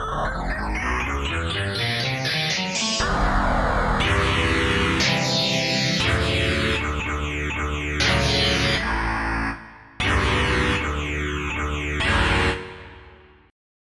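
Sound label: Mondo Flange, fuzz guitars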